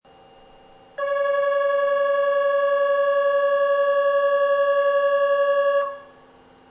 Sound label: bell, osaka